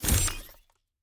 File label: Door, Sci-Fi Sounds